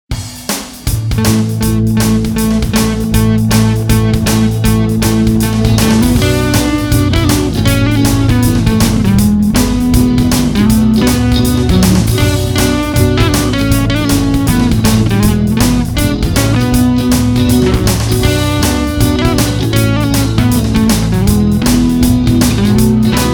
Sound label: Riff